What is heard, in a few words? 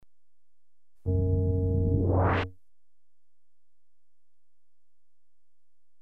Sliding door (rapid with mechanism hum), Electronically Generated Sounds; Sound Effects Library